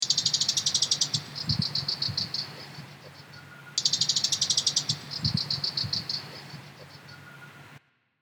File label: Warbler